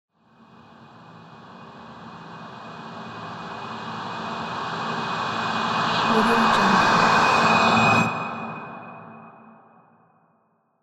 dark ghostly riser

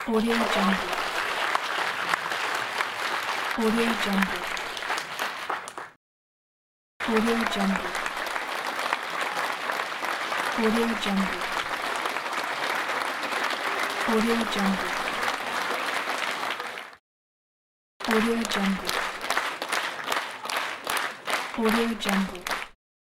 applause

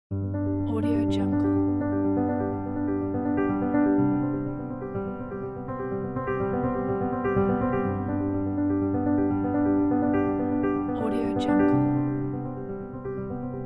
emotional piano arpeggios; leon felekyan

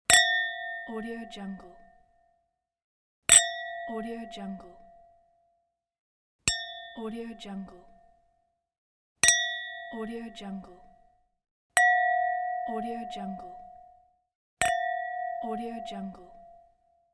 wine glasses

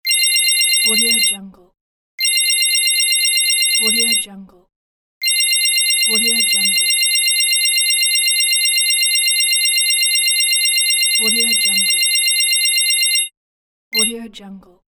bonus coins collected; apple hill studios

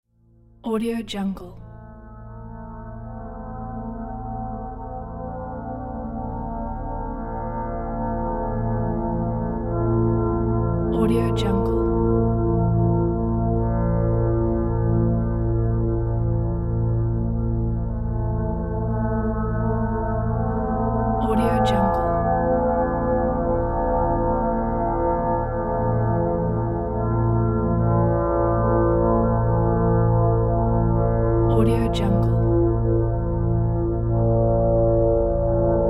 background soundscape calm ambient

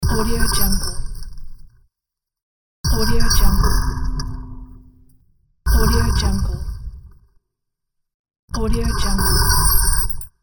noise radio

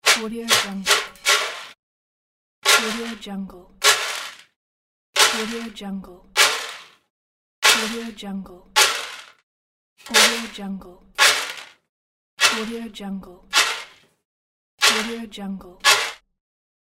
shake and drop cereal into plastic drum

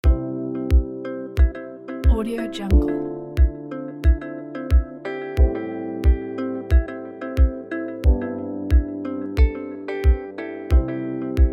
minimal

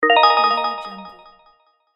new message

